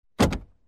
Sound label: lock